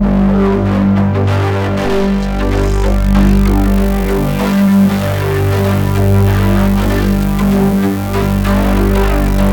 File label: MORPHUZZ; Spectrasonic Distorted Reality 1; MORPHUZZ BS